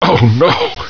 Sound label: hurt